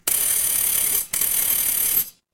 office alarm clock

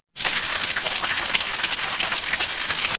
water stream

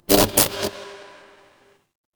fire electric arc

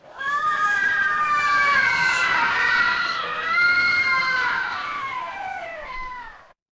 crowd cheer

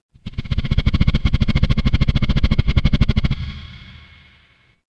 mechanical engine

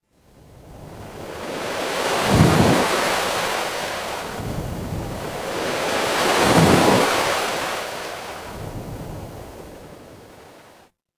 ambience ocean

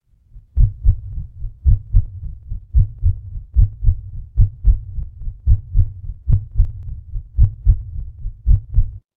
horror heartbeat

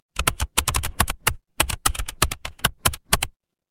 office keyboard

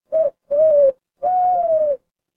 animal owl

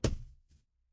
impact thud

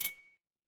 feedback success

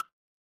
cartoon bonk

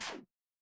retro game laser

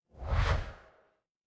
ui swipe

